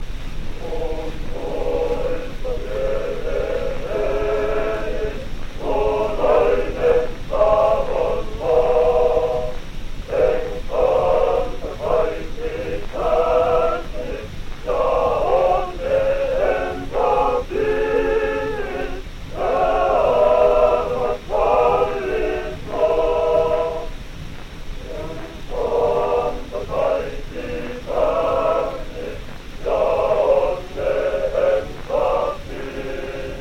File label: Gramophone